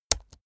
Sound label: keyboard laptop space press slow hard